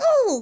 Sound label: panting cold; sm64coopdx; char-select-extra-chars; toadette